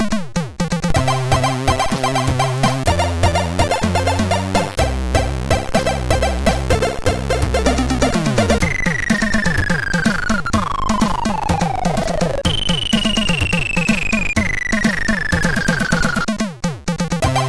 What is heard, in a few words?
Intro samba, sid MOS6581R3